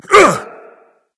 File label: grunt jump berserk; cuchulainn